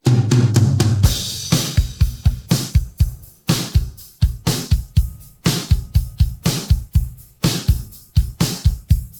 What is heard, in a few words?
pattern, drums